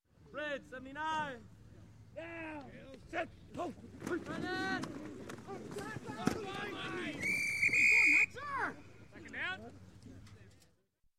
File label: Football Game; Sound Ideas 2000 Series